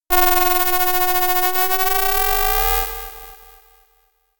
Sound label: Beam